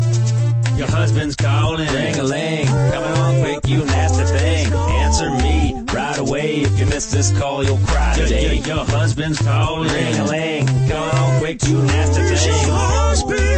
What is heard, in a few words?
Hip Hop Ring